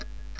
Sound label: ticktack